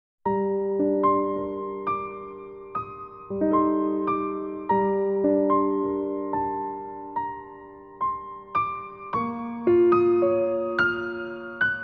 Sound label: Piano